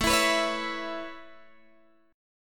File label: A Major Add 9th A Guitar Open G, chord